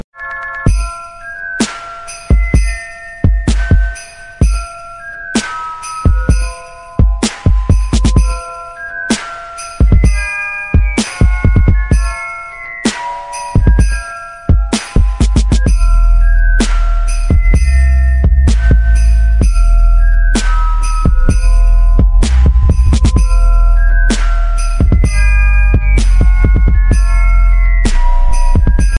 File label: Trap Ringtone; Harry Potter